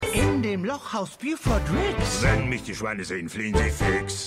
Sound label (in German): Gesang; Madame; Schmigadoon